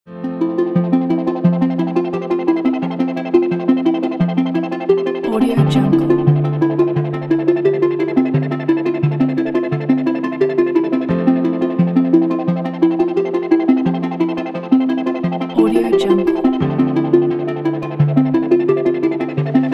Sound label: inspiring drum and bass